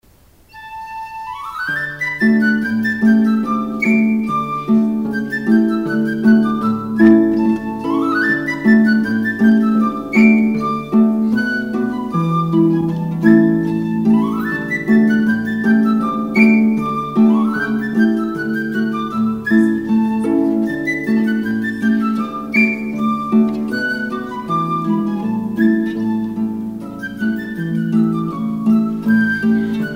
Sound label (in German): Piccolo Harfe